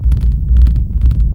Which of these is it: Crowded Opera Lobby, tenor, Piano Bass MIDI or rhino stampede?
rhino stampede